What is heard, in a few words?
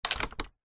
household gate wooden close metal latch; zapsplat